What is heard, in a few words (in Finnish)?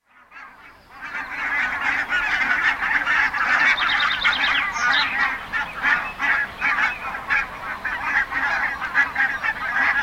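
valkoposkihanhi, lintuäänet; Maatalousympäristöt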